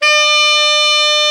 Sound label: BRA ALT, SAX; Best Service Gigapack 1